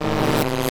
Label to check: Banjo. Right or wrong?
Wrong